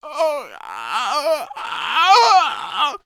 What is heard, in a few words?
npc male eaten; DeadFright